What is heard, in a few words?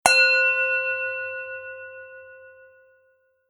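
contemporary, ringeklokke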